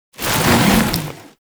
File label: Heavy Melee Dash; Enemies; Warframe; Grineer Nightwatch; Grineer Fortress Heavy Melee